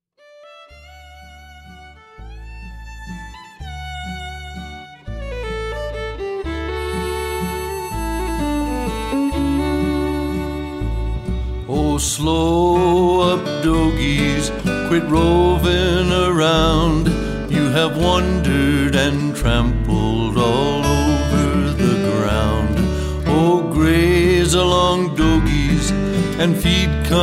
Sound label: Night Herding Song